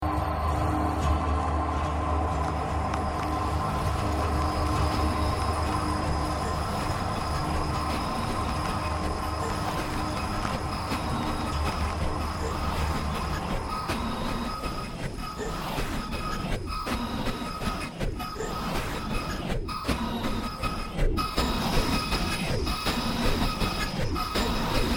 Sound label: Horn; noisesurfer; Trip